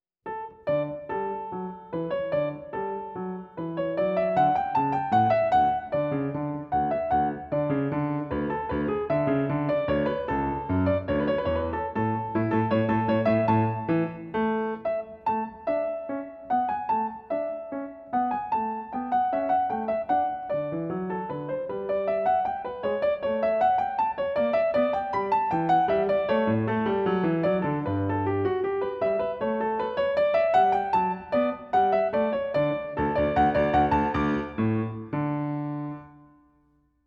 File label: March in D Major; BWV Anh 122; Carl Philipp Emanuel Bach; Celebrate Theory recordings